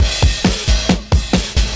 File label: CYMB, Breakbeats